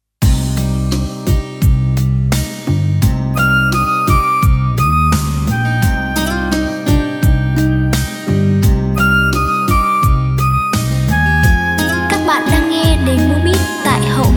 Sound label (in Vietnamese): beat